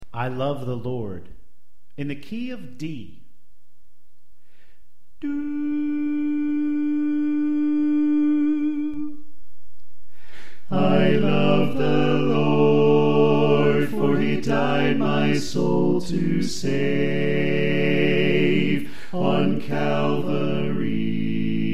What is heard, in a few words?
Tenor Left